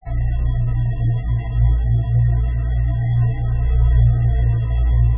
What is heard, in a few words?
sound bell; xmas